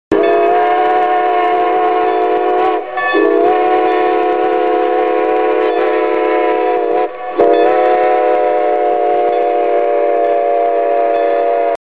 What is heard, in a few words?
horn